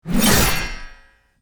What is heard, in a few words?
metalic shwing sword